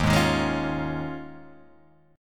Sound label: Eb 9th Suspended 4th, Guitar, Half Step Down